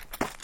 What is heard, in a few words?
step; svencoop; legends